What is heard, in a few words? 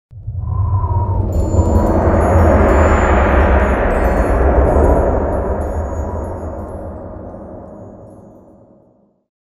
Howling Wind; Eternal Darkness Requiem